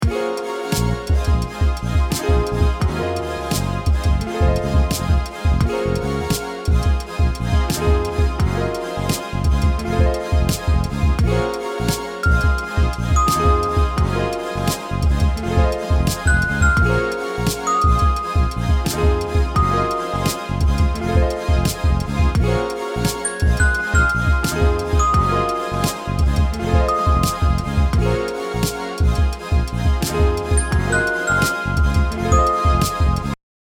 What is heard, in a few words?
menu music